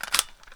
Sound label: g36c mag in; garrysmod